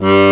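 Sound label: bandoneon G1, accordion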